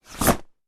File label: umbrella; bonny betty